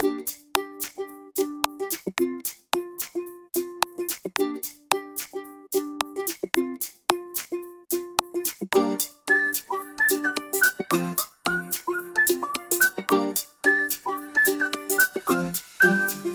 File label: dopamine ukulele